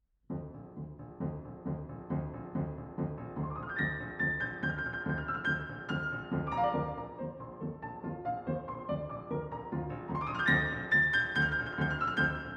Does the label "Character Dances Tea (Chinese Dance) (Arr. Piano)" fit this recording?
Yes